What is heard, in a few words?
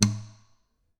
Classical Guitar Damped Pluck; Classical Guitar Extended Technique Stereo